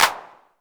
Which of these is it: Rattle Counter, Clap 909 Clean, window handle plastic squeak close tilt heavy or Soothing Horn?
Clap 909 Clean